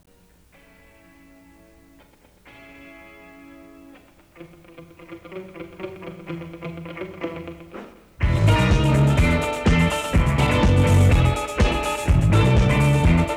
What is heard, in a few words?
Instrumental